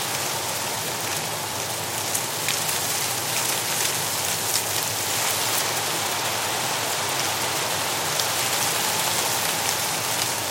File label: amb tree rustle lp